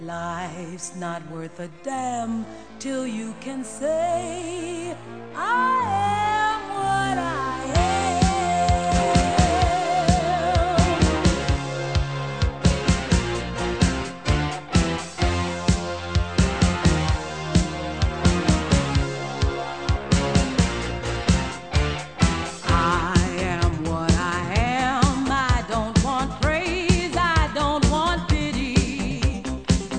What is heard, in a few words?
disco